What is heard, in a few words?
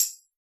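hip hop tambourine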